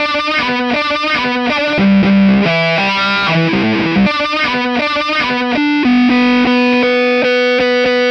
Wah Guitar Riff; Guitars

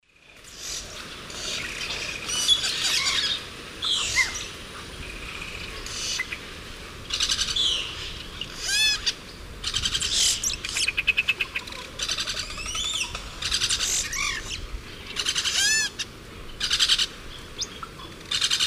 Cacicus cela, calls; S. America; Eastern Lowlands

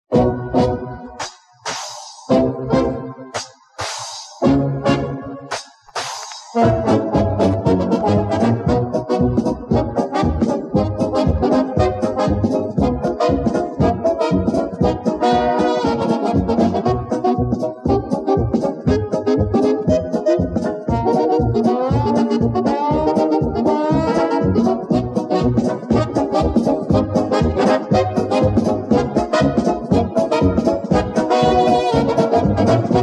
Charleston, Jazz

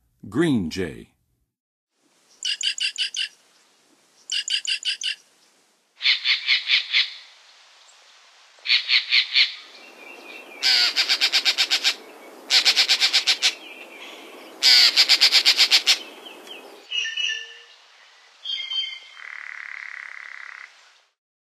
Green Jay, Songs of Birds; North America; Western USA; Western USA Songbirds

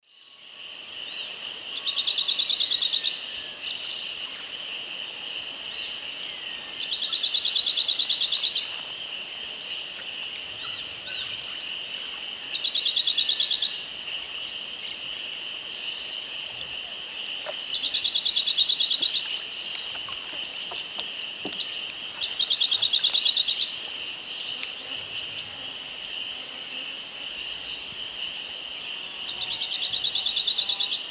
Polioptila plumbea, song; S. America; Eastern Lowlands

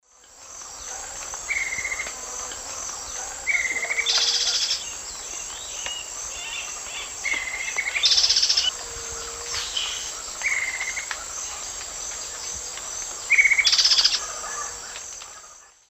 Myrmochanes hemileucus, subsong and alarm call; S. America; Eastern Lowlands

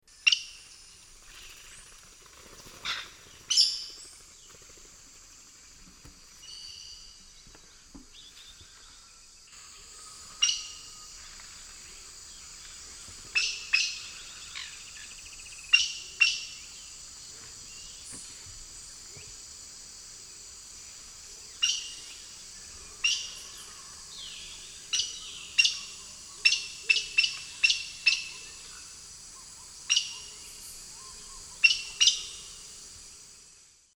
Pteroglossus pluricinctus, calls; S. America; Eastern Lowlands

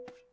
bongo, latin hits n licks

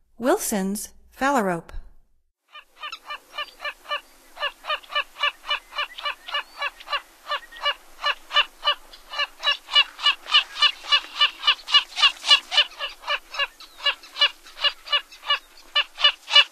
Wilsons Phalarope; North America; Stokes

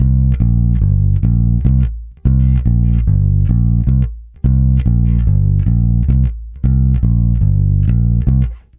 Bass C Funk

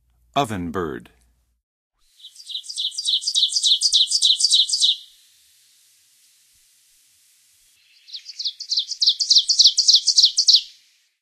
Ovenbird; North America; Western USA; Western USA Songbirds

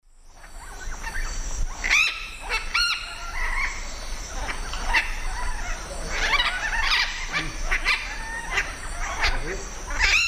Orthopsitta manilata, calls group; S. America; Eastern Lowlands

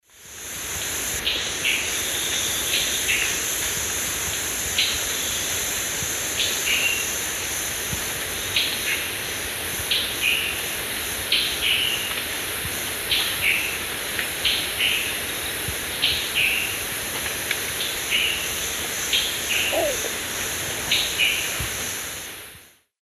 Piranga olivacea, call; S. America; Eastern Lowlands

